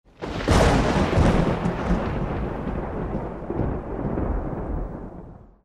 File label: thunder; day-night-cycle